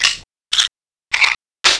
reload

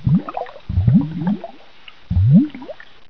plops

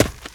Player Run Dirt

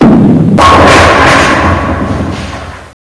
Bowling

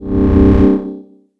copy sound

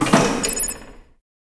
button; depths

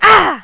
wound

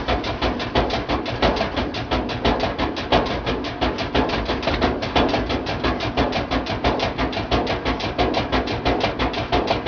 washer

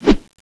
baseball bat swing

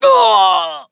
damage

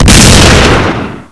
870 elephant shot

